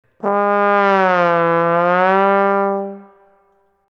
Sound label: glissando trombone